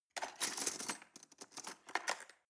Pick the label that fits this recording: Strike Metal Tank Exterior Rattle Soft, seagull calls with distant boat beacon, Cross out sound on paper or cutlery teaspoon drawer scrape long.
cutlery teaspoon drawer scrape long